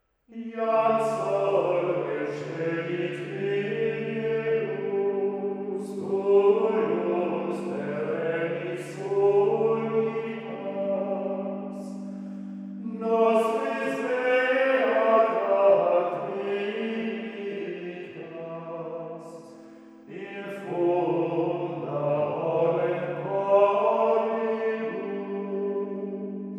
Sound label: Hymne Vepres, schola; sainte trinite; spiritualite; Jam sol recedit; schola bellarmina